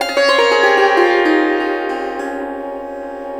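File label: sitar glissando; Spectrasonics; Heart of Asia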